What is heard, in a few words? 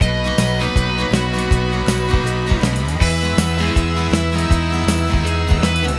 brit-pop, ringtones; Google; NewWave Labs